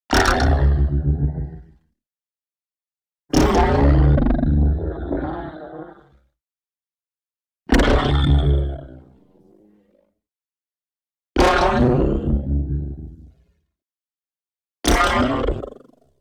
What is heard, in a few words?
neon hit small tonal flashover; boom library